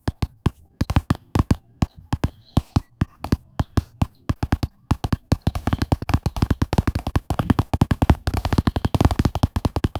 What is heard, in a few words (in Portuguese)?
para um jogo pixel de, pixel game